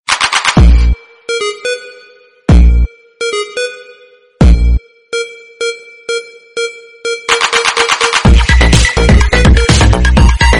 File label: Load Music Ringtone